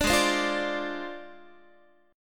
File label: G sharp 6th Flat 5th G sharp Guitar; G#6b5; strummed